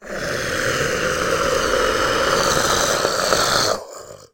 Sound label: Monster Animal Sneer